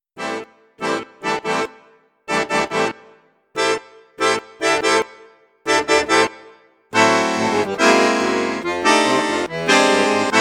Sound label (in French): registre basson flute juste piccolo, accordeon; cavagnolo virtuose 4